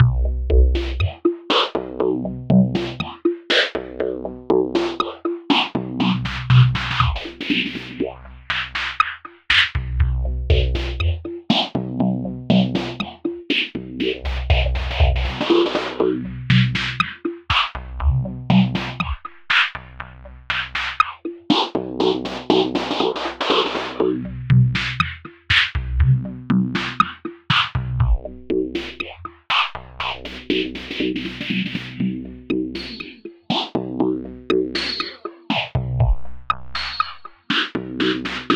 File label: 120bpm beat